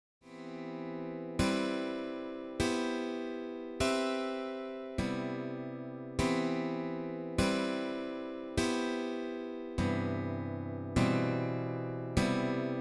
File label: seventh chords practice, guitar